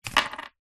foley money 5 dollar note and dollar coin set down on table; zapsplat